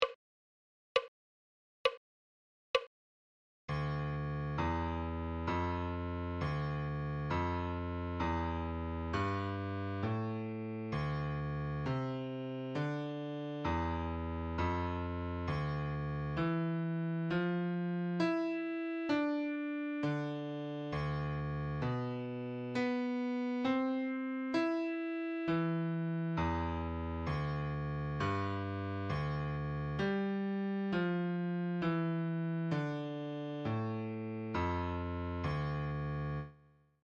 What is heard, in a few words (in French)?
Clé de Fa - Adagio